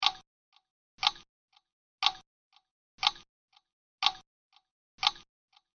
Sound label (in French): tictac